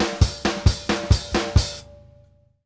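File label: hat snare, drum fills; Sampleheads - New York City Drumworks VOL-1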